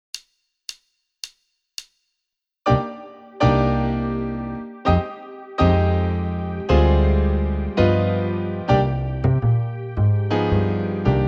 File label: Backing Jazz Ballad